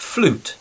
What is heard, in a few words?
flute